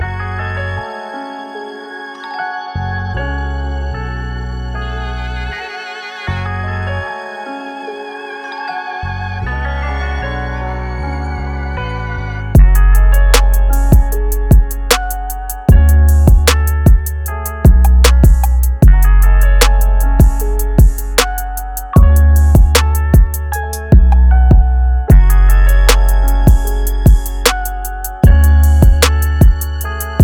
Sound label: f minor 153bpm